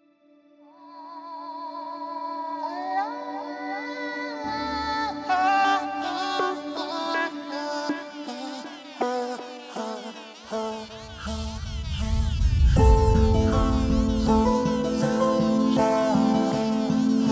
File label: Theme Uplifting